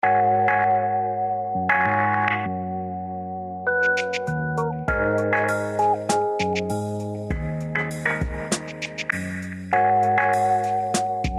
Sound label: on hold; textalk; loop